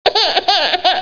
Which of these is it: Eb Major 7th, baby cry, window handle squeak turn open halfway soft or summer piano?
baby cry